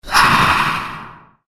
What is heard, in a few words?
Cry Of The Crypt Beast; Monster Sound Effects; fantasy sound effect